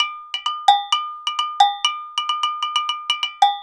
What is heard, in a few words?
132 Agogo Ptn; Roland BK-5; Loops & Phrases